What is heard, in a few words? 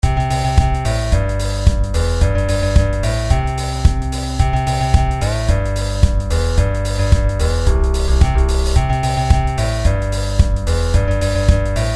swing low